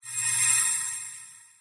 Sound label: Click